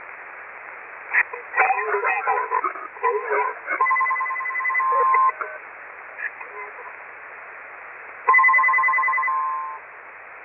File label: HARRIS RAU and AVS with ring tones; HARRIS RF-3575 RAU with male voice prompt using Harris Analogue Voice Security (AVS) and ring tones